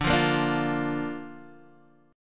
D Minor Sharp 5th D down Guitar Open D

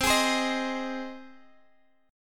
C Minor Sharp 5th, Guitar, Half Step Down

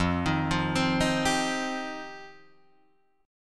F 7th Suspended 2nd F down Guitar Drop D

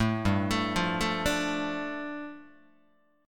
G 7th Suspended 2nd, Guitar; arpeggiated; JGuitar

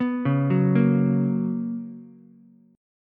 B Major 7th Suspended 2nd Ukulele, chord

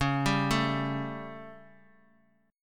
G Suspended 2nd Flat 5th C sharp

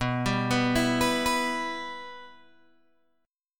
B Suspended 4th Sharp 5th B Guitar Open G

